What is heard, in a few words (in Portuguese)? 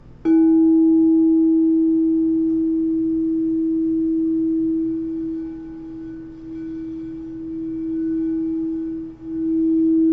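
FOSCA E3, instrumentos de quartzo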